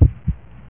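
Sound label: heartbeat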